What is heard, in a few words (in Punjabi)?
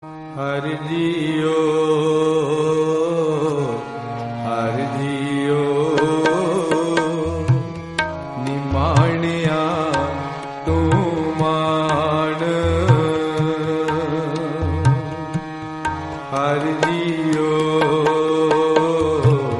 har jiyo har jiyo punjabi waheguru bhajan